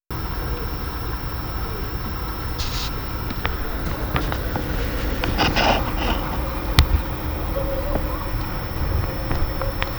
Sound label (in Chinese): 水流噪音, 家居噪音; 家居噪音音频数据集